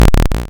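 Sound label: waveform FM sawtooth sine envelope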